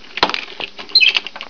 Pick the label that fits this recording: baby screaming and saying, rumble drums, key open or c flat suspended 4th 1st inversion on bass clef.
key open